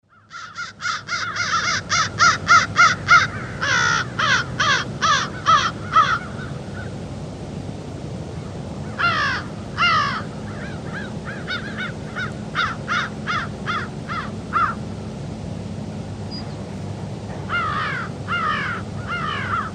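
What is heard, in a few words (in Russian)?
вороны в лесу среди деревьев на ветру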